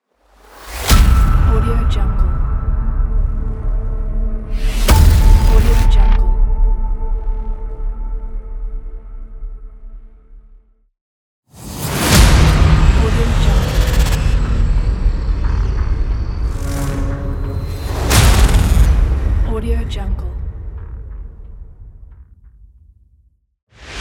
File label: logo trailer pack; artsound